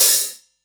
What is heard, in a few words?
HH rock; EMU Orbit-3